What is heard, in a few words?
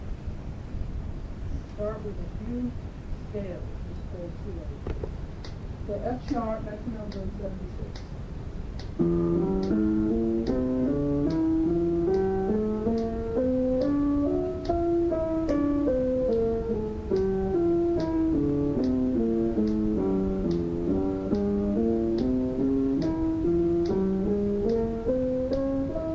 Practice Scales Arpeggios; Practice in PA for next lesson